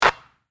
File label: Basketball Net, Sports, Swish; Blast Wave